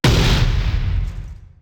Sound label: gun